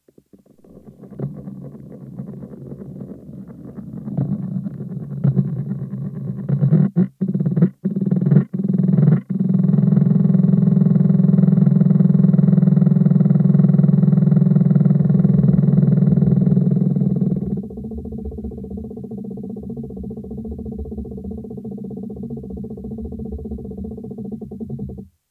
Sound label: duet